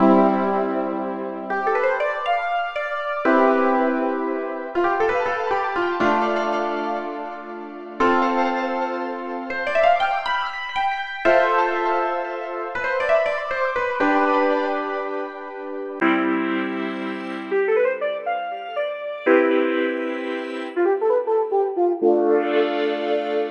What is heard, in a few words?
Augmented Piano EP alle